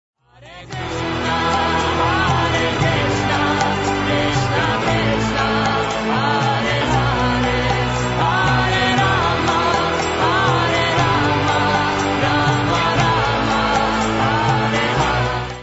Hare Krishna, Electro Punk

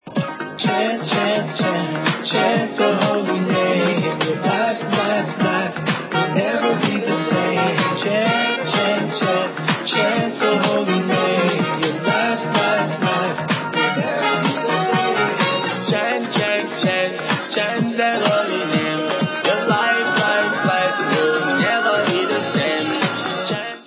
Chant chant chant

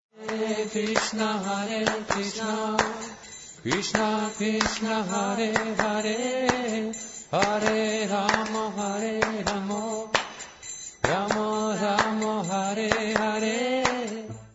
Clap, Guitar; Hare Krishna

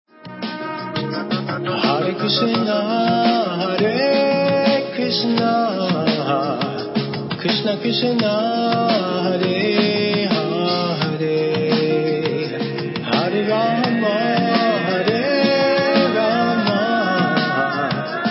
Nokia hare krishna, ringtones

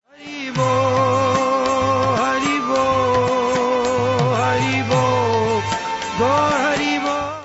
Hari Bol

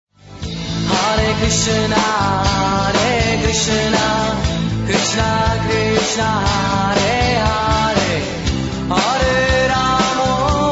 Maha Mantra, Rock

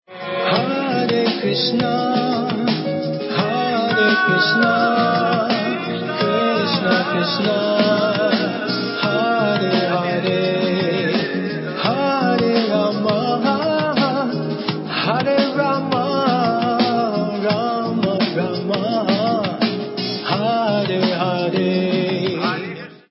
Nokia hare krishna, ringtones

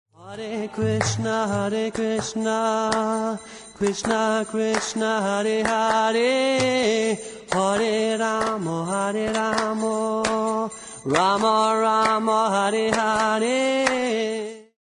Hare Krishna Clap, Guitar

